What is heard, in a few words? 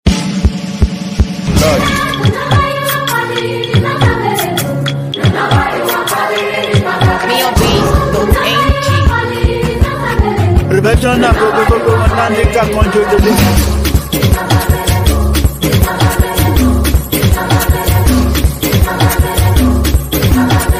Professional Beat